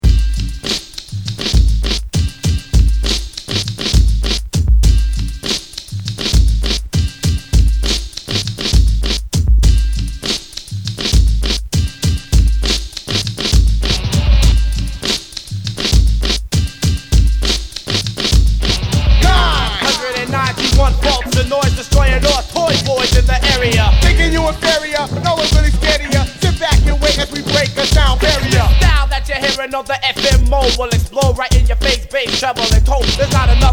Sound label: 991 Volts Of Noise Vocal; B Boy records